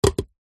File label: concrete block set down ground; foley; zapsplat